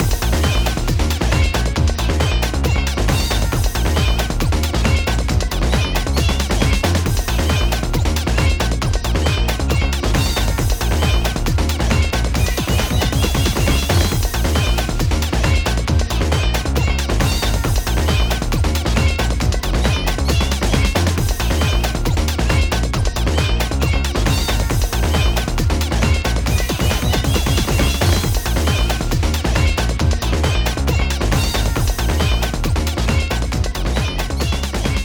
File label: BGM RESULT